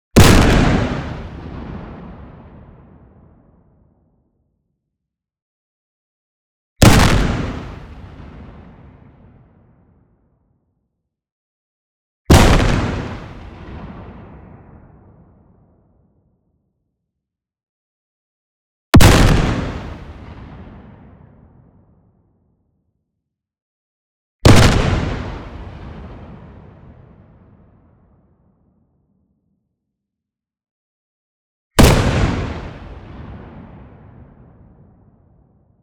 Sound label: EXPLOSION LONG Car Crusher; boom library; Anime Essentials